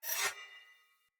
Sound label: Dagger Whoosh Accent; Weapons; Player; Swords